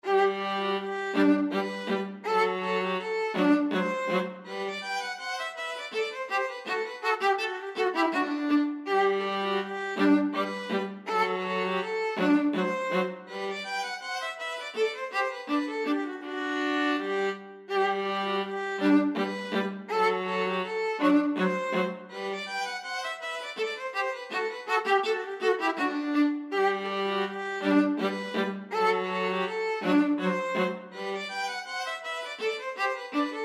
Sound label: violin viola duet